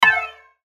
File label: button tonal flange; Multimedia; Buttons; Blastwave